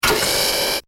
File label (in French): machine